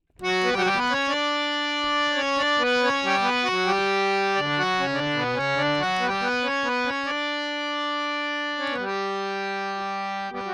Dry DM 4-4 70, Harmonioum; Melody; Sample Banks

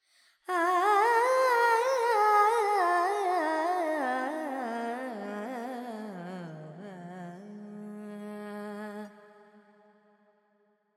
Pentatonic, Vocals, Carnatic Female, Alaps, G

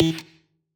Perc; Sample Banks; Percussion

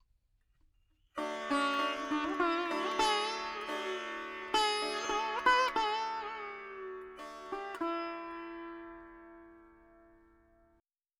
4 4 D 100 Dry, Melody Veena; Sample Banks

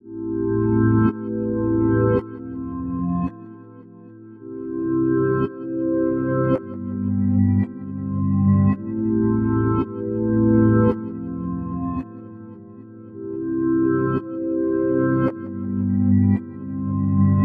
Keys Pop Reverse Am 110; Sample Banks; Melody; Keyboards

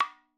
madalam notes R

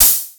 HiHat, Percussion; Sample Banks

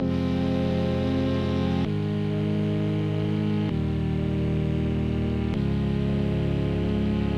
Key Vintage Fm 130; Sample Banks; Melody; Keyboards

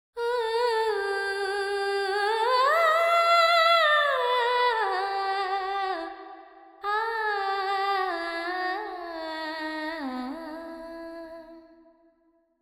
Verb 4-4 Em 70, Vocals Female; Emin

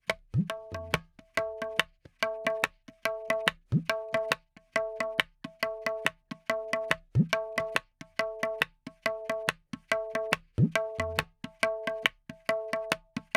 Tabla, 4-4, 70; Sample Banks; Percussion

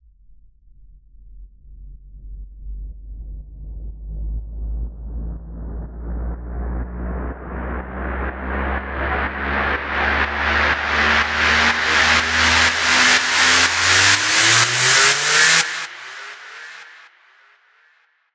FX Uplifter; Sample Banks; Percussion

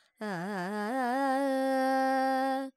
Charukesi, Vocals, Carnatic Female, Alaps, G, Dry

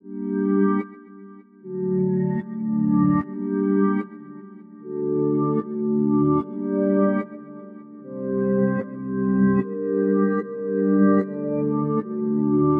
Keys Reverse Dark Chords Fm 160; Sample Banks; Melody; Keyboards

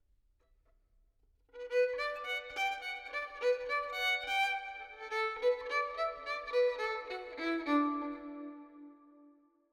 violin verb 4-4 Bm 70; Melody

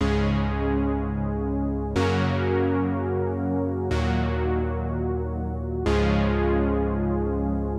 Juno C# 123; Melody; Keyboards

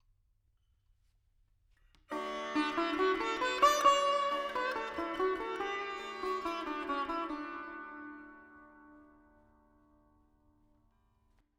Veena D 70 Verb; Sample Banks; Melody; 4-4

